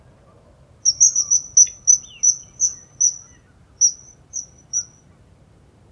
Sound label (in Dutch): ijsvogel roep